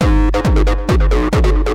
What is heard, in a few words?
confusion, radio loops